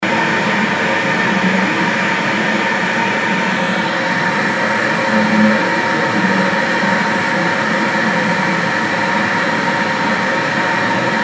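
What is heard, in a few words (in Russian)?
звук помех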